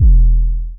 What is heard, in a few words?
808, E